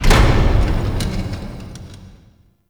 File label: Temple Door Open; Warframe; Harrow; Red Veil Temple